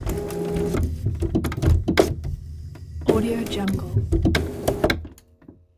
robotic lifeforms servos source printer reloading servo; robotic lifeforms 2; servo move